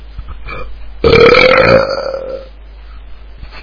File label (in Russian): otrizhka